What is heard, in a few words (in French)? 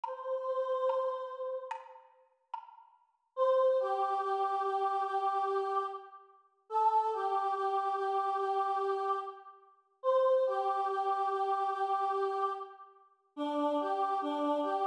Chant